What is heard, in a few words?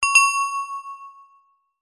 multimedia alert notification basic bell synth positive chime ring